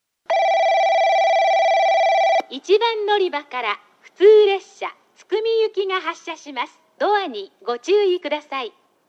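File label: fireworks; 大分駅 発車放送（津久見行き）; tukumi hanabi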